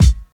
Smooth Hip-Hop Kick Sound C Key, Royality free bass drum tuned to the C note.; 90s